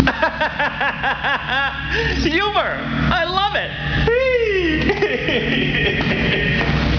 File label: laugh